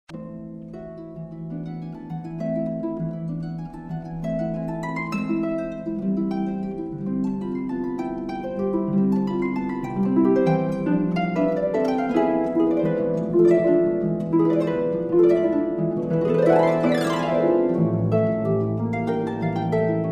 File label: siciliana tango spanish dance, harp